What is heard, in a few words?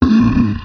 golem layer; towers